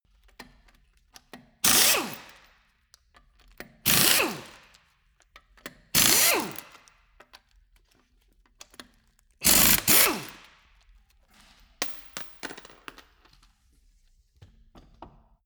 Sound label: impact wrench screw sequence